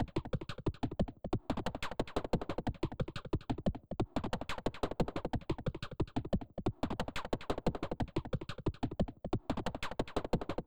Main Level Drums Only loop; Helios

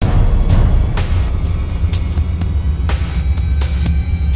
Medium Music; Alien Breed Trilogy; PS3; Xbox 360

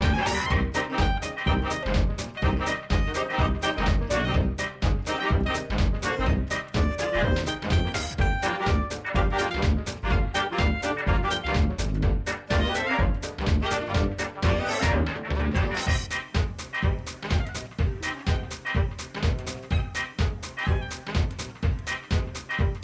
Swing Bop; Little Big Planet; PlayStation Vita

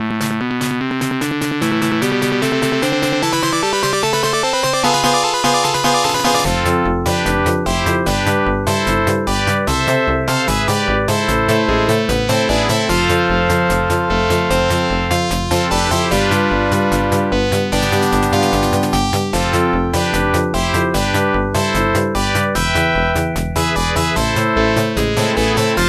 Air Battle; 1943 The Battle of Midway

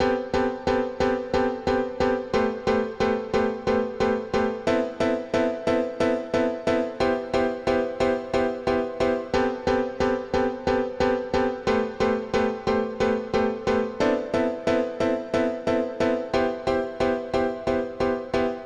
Dance Piano; super crush k.o.

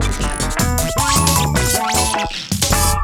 Jingle; Namco Anthology 2; PS1

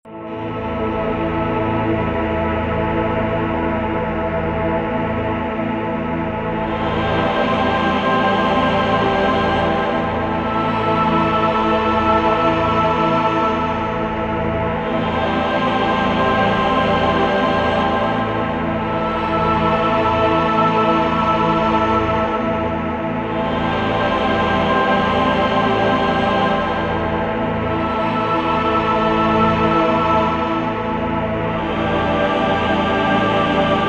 Choirs Strings Intro; Enriscapes

